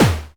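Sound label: snare, Roland MKS-80 Drums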